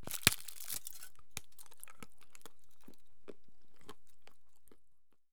eat; alyonka; Escape from Tarkov

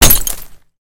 hit cockpit glass; War Thunder

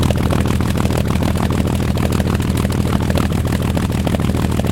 me109 rear, engines; War Thunder

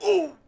Hit Vox; Civ4 warlords

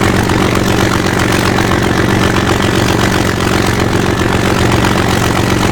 Aircrafts Engines Jumo213A; DCS

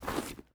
ui pickup leather; Rust

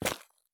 run gravel; Escape from Tarkov

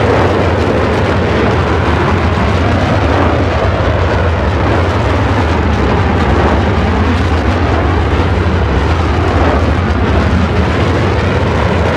icbm loop; Modern Warfare

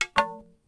Drop Staff; Civ4 warlords

